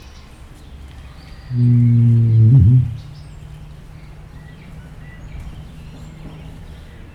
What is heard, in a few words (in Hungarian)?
ráadás, nandu; pecsi zoo 2017